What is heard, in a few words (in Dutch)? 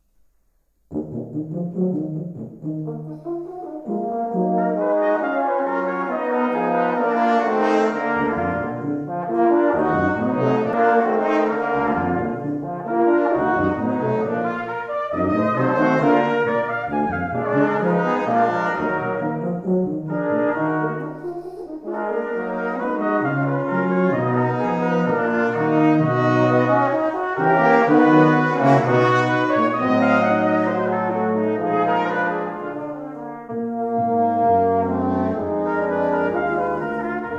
Quintet Variatie; Ewald; Quintet 2 opus 6